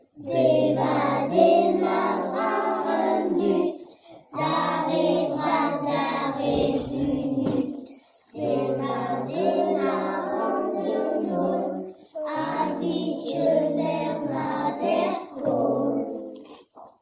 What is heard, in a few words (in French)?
chant pour dire bonjour; demat razh an dud